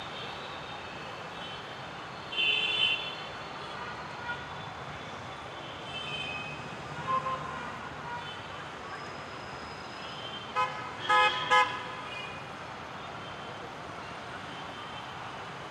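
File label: Ambience Red-Corridor Traffic Loop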